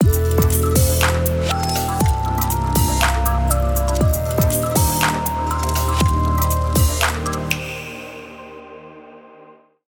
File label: Start Jingle